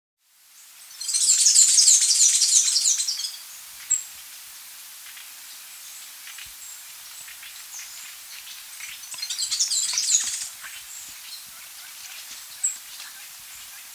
Black-Eared Hemispingus, Hemispingus, Nature's Songs, S. America